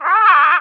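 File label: dead raccoon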